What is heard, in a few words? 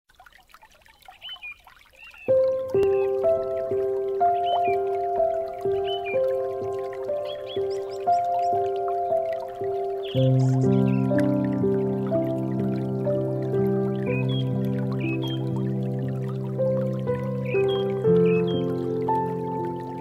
bird and piano